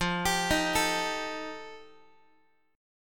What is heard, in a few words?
F 9th F Guitar, chord; arpeggiated; JGuitar